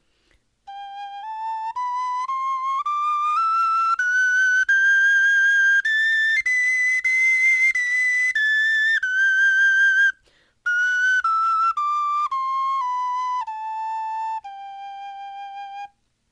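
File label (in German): tonleiter, Knochenflöten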